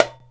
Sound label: DUMBEK, ETHNIC PERC; InVision Interactive; Lightware VOL-1